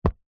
foley footstep single trainer wood floor gentle soft